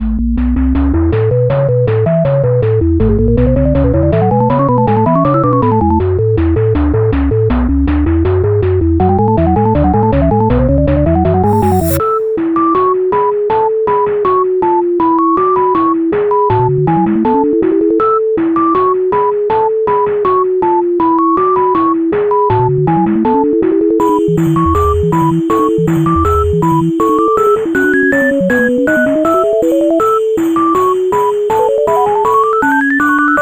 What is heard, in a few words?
robot christmas, chiptunes